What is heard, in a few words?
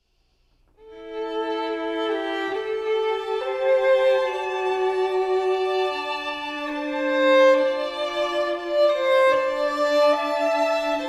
string quartet; Amherst